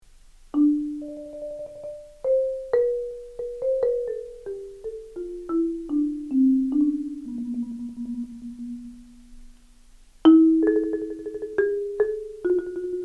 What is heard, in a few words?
vinylen